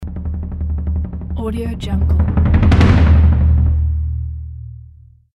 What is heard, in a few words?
timpani fill; blackalexstudio